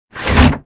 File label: pause fly in; Tony Hawk's Project 8; XBOX